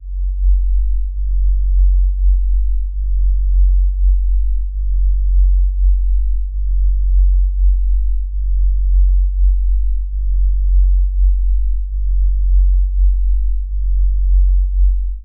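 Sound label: spaceship rumble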